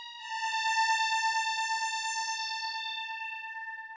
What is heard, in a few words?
Bright Strings; opxpro2; Riddle